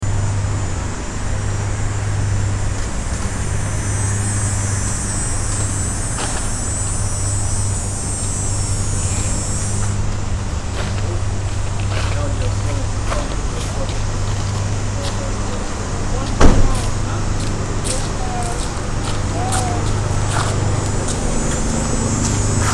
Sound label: drill